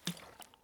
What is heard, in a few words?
bottle drop; Escape from Tarkov